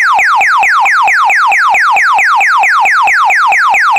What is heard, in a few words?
Alarm Commercial